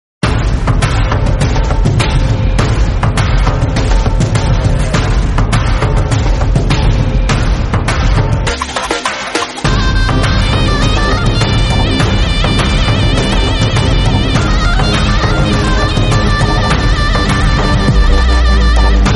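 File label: Tamil Background Music Ringtone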